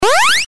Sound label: jump